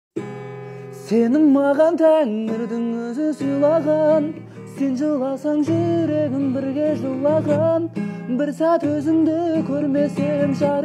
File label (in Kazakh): kaver gitara